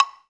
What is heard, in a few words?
PRC BLOK, Orch Blocks; Roland LCDP03 Orchestral Perc; Orch Toys